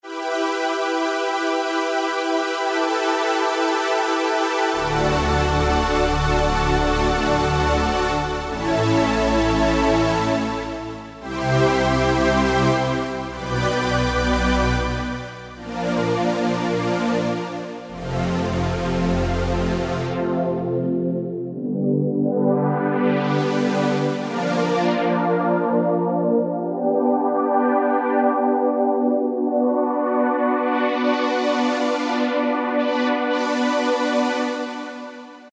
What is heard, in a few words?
Strg, Loops, Yamaha A4000; Syntraxx - Loops